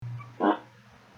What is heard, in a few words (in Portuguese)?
peido